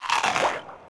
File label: bite; Feeding Frenzy